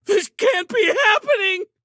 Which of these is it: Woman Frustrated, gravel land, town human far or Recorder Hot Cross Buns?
town human far